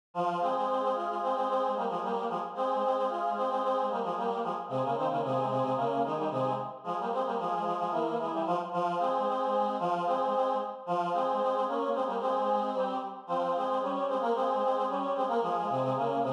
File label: Oh Hanukkah Choir Part Only; satb sacred